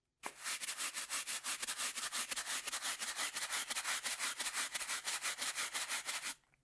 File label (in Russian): zvuk shhetki